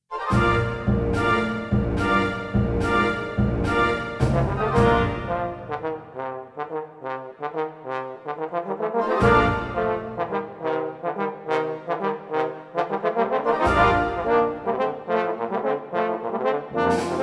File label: fanfare in Bb live trbs compu orch